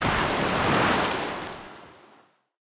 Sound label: Cannon Miss; Pirates Of Treasure Island